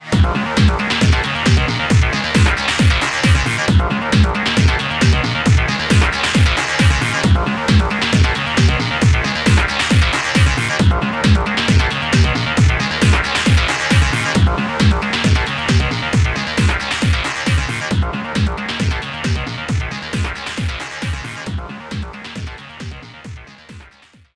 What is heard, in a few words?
BGM CONTINUE; Pokken Tournament; Namco System ES3B; arcade